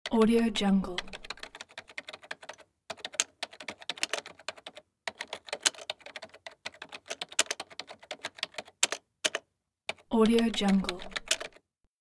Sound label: keyboard typing